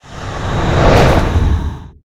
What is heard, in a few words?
mode off